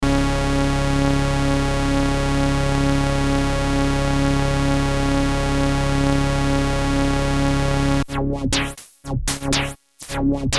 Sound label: FILTER SEM Synth; ARTURIA